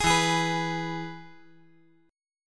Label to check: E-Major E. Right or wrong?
Right